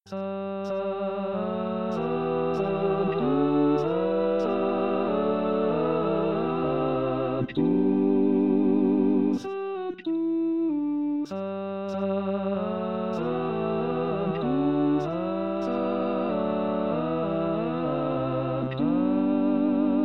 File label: Missa De Angelis Sanctus Coro; Bartolucci; messe